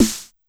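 G1 Analog Snare Drum Snappy, Yamaha PSR-E323 Dance Kit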